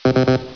wrong

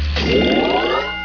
ringin; ydkj; orange

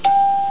yes; usa

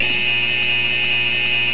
buzz

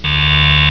strike; mexico

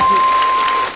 ding; vietnam

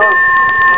ding

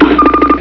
buzz in

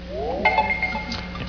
reveal word; usa

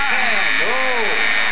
buzzer; usa

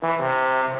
strike; philippines

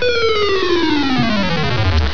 too long; motus; france

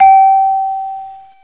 bell

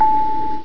ding

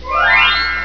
reveal; mexico

